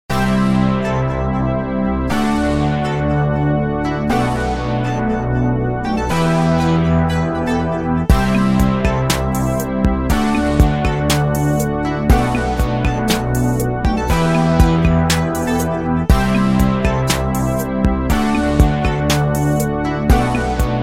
ringtone rnb

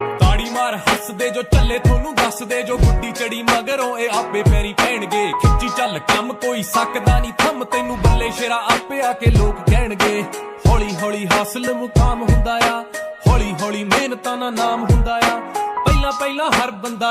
punjabi song ringtone

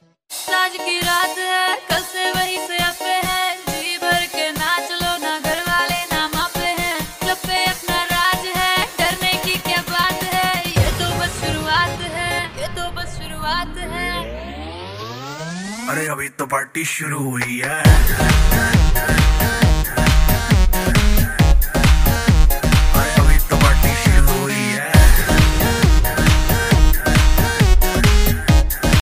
love music ringtone

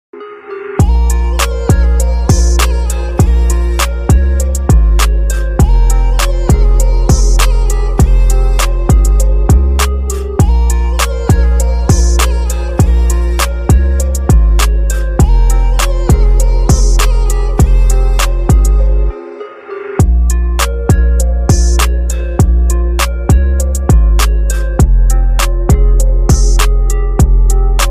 pulse beast inside beat ringtone

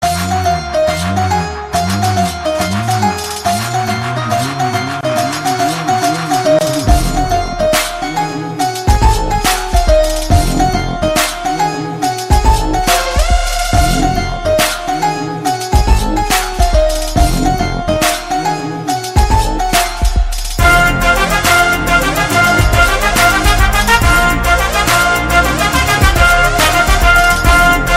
bgm ringtone, tamil; Kaththi

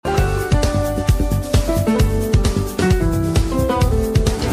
Samsung ringtone; samsung 2016

